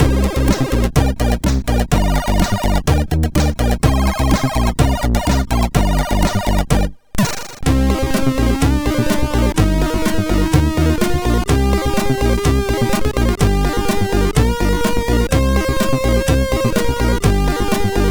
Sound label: Funk Extend, MOS6581R4